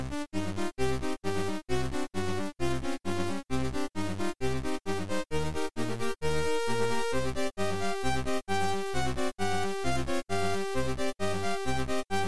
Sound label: 8bit